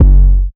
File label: 808 (F#), 808s; drumkits; fl studio; bvker drumkit; Born 808